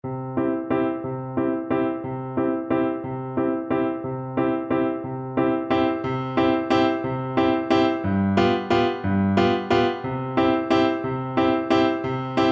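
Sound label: recorder karaoke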